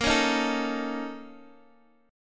Bb Minor Major 7th Double Flat 5th, Guitar, Open D; x,x,8,7,6,7